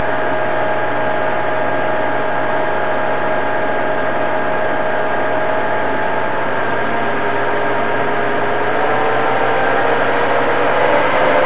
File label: tornado